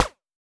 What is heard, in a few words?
Fireball Explosion, Laser; Run-and-capture